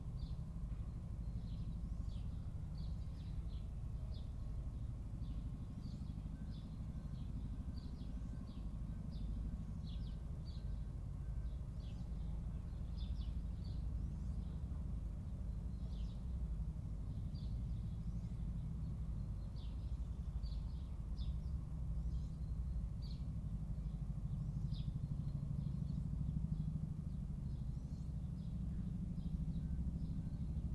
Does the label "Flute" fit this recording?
No